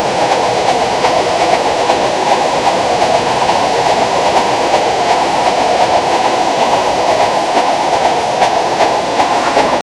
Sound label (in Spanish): monoriel funcionando tren; monorail